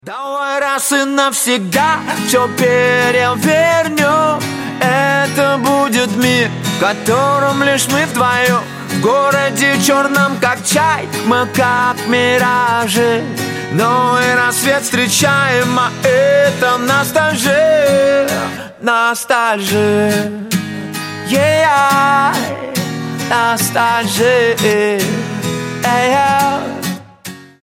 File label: acoustic version